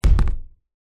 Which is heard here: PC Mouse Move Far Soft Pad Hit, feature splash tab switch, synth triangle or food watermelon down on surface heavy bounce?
food watermelon down on surface heavy bounce